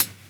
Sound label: CHH, Closed Hats